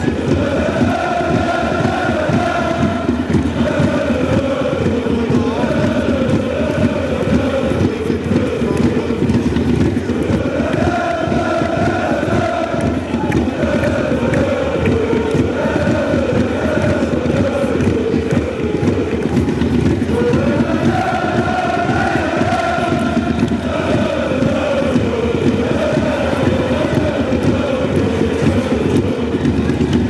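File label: fanchants, football chants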